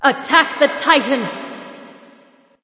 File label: vox attack light; smite; hel